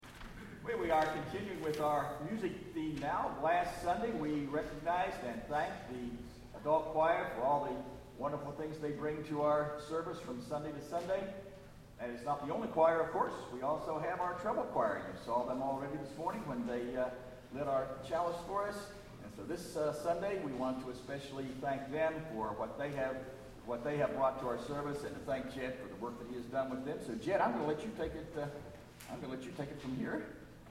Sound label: treble choir